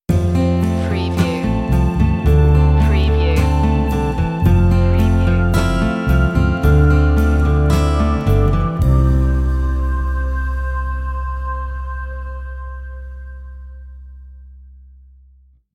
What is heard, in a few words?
acoustic ocean stinger